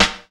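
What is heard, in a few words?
SP-12, drum machine; Best Service Gigapack 2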